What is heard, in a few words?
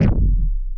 alien pulse heartbeat heart beat; cyber drums 2023; drum kit; nova sound